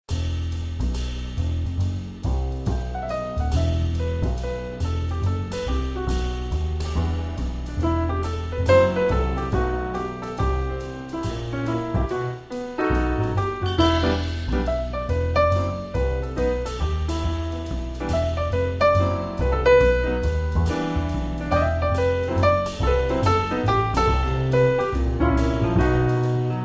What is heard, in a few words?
Jazz Piano Trio; Bossanova; Barcelo Hotels recepcion